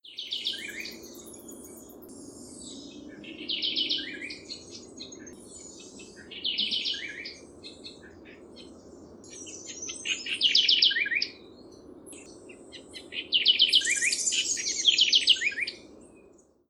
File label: troglodytes musculus, aves; historia natural; galeria biodiversidad